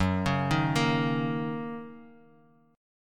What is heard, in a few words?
Gb 6th Gb down Guitar Drop D